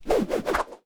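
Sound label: infantry sling, weapon; Battle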